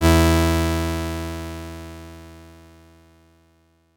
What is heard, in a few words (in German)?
Gesamt Chor Ff 415Hz, Leise Lauten; Chor 7 (Ff); (Saiten 12 und 13)